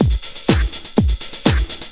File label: LOOP 124; Sounds Good - Eurotech